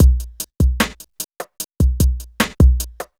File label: hiphop